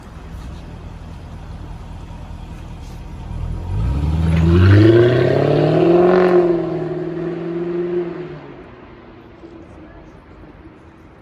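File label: Jaguar, exhaust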